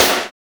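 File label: WORKING SNARE; Drums In Your FACE!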